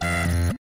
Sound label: Sci-fi glitch futuristic rapid